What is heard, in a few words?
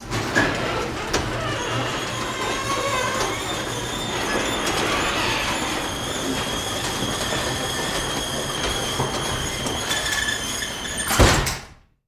Door Garage Close